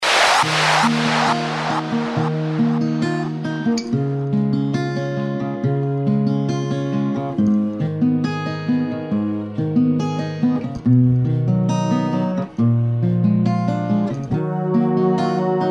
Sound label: Dub